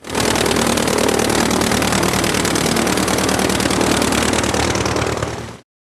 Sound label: nuke